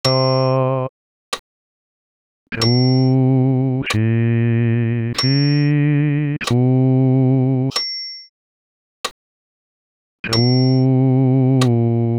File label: Crucifixus bas voc seul